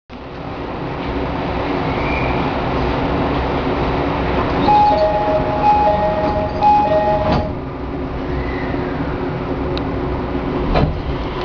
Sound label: e501 igbt; tokai-omika